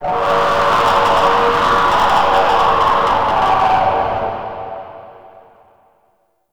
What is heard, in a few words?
Resonating Didgeridoo; Warframe; Shadowgrapher